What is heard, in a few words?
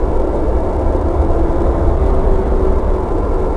ambient